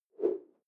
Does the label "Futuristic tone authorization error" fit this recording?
No